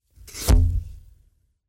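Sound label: magic disappearance or appearance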